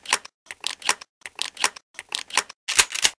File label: Pump Shotgun Reload; Invector-3rdPersonController; Shooter; Weapons